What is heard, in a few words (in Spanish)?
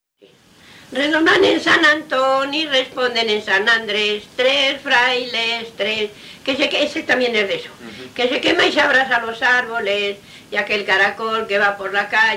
Tejidos de Cintas; Cigales; El caracol de Cigales